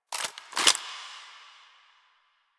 Gun Move Foley; Frumentarius; Warframe; Ambience; Nineteen Ninety Nine; DE

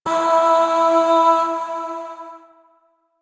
Void Shawzin Oct Two E; Warframe; Zariman

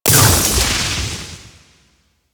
Core Reactor Destroy; Warframe; Ambience; Core Rooms

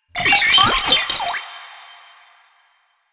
Sniper Drone Vocal

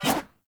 Sword Whoosh; Weapons; Player; Swords; Garren Sword

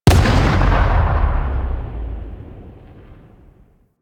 Space Mine Explosion; Hazards; Warframe; Railjack

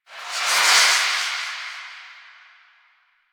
In Range; Jade Shadows; Birth Minigame

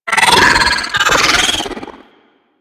Cinematic Vocal; Warframe; Hyena

